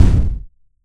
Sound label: explosion; NIIIEMAND's explosion sfx